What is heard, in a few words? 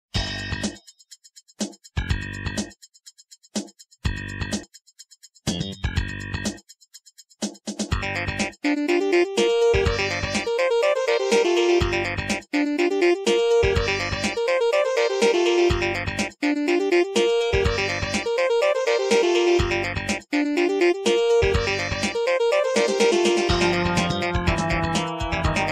Industrial Area